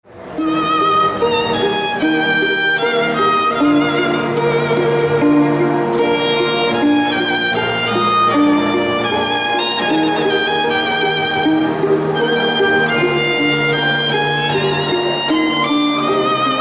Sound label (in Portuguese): INVERNO QUARTETO DE CORDAS E TECLADO